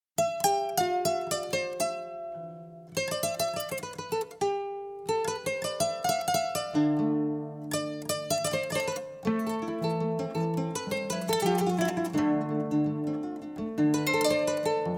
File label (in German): Instrumentalmusik